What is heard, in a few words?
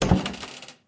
Open Door Wooden; Lethal Company